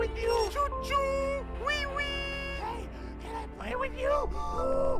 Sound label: choo choo whee whee